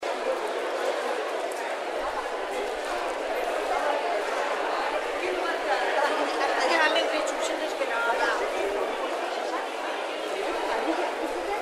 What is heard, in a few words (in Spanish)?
Ambiente del mercado de Gernika-Lumo; estuario sup oka